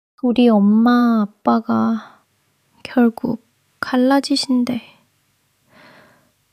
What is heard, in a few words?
crying; monologue